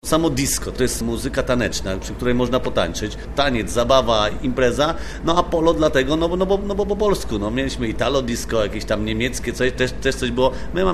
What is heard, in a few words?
disco